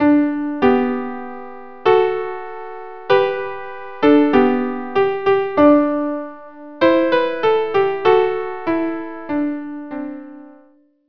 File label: violin solo